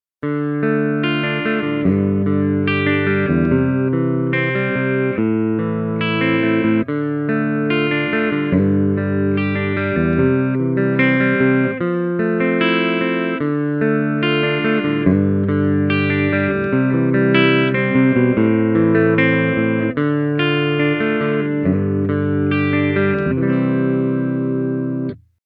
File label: Bridge Clean; legend